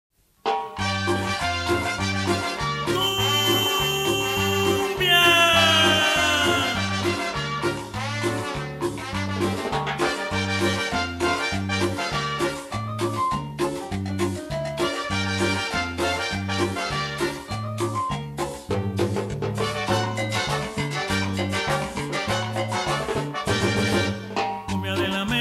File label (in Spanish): cumbia de la media noche